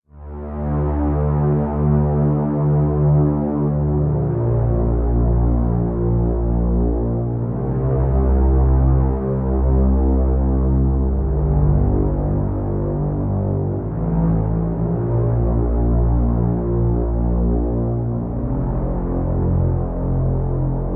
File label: Crashlanding; mass effect 2